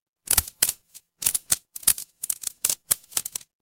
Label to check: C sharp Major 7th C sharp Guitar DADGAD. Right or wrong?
Wrong